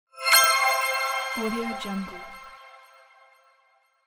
magic zen

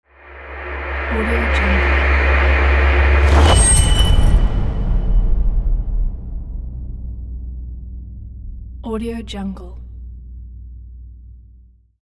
modern militant transition

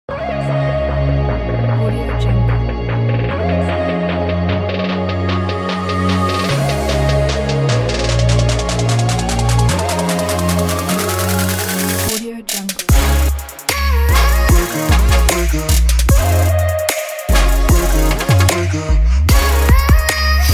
the future bass pack

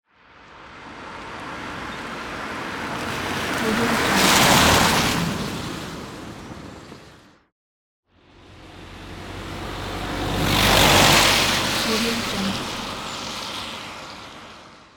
car passing by on wet street

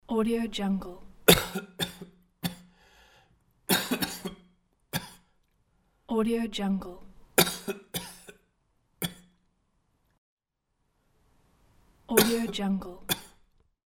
ough

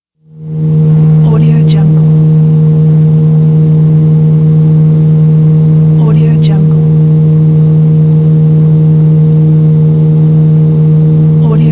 boat at sea; wistansound

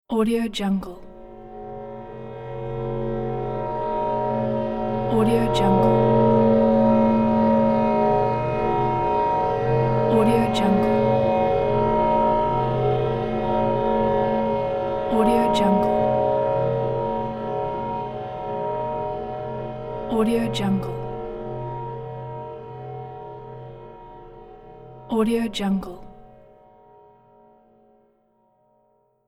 horror transition layer

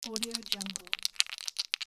dice shaking loop

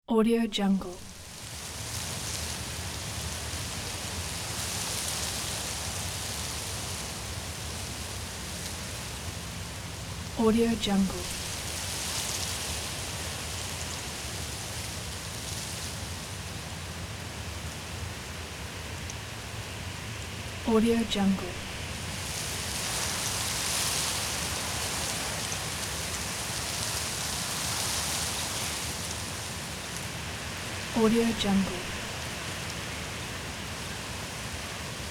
wind in leaves

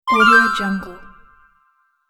bonus for games and more

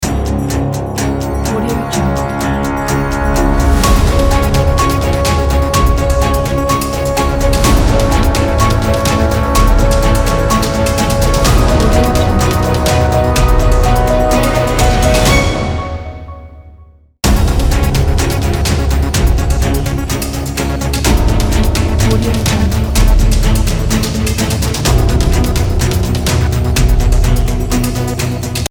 news ident

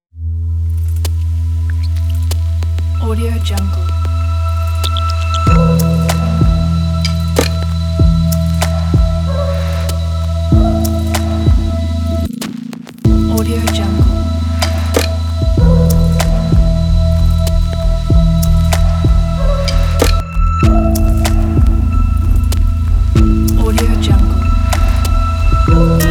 documentary ambient; audiogreen

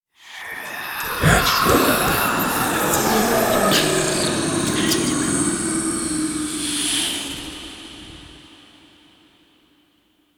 elemental evil breath; soundmorph

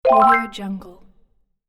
classic bonus points

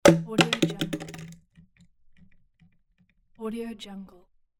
large plastic bottle drop

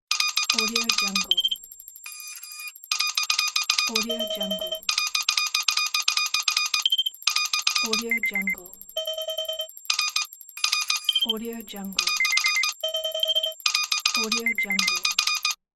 hi tech cybernetic device

